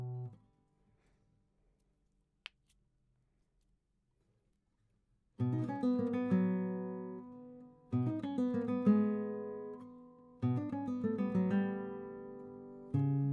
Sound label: GTR